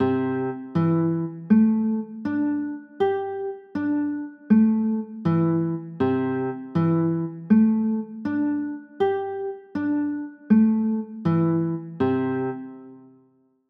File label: c 6 9, chords